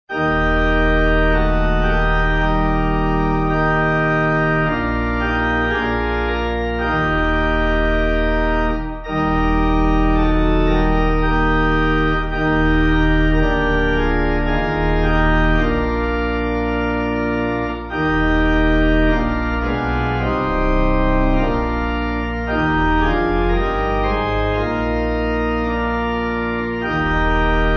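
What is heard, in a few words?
Pipe Organ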